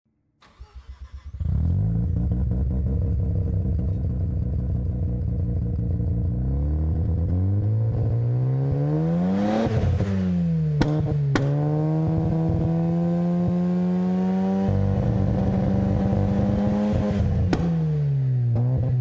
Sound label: rx7